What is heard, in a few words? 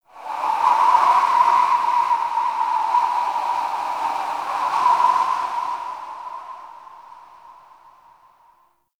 Whistling Wind; Warframe; Tau